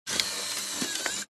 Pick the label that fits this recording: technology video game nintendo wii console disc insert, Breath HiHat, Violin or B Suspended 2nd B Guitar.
technology video game nintendo wii console disc insert